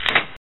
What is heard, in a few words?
loading gun